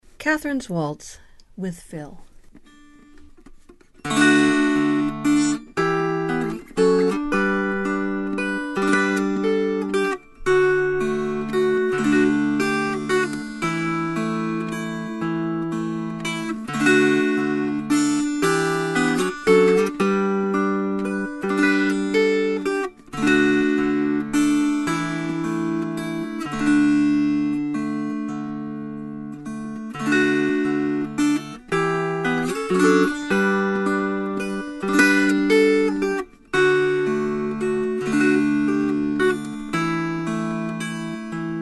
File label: Waltz Fill